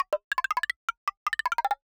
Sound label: Random Blocks; musicradar; cartoon caper